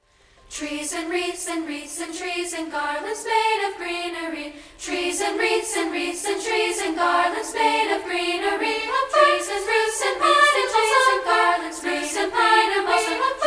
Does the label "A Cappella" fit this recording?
Yes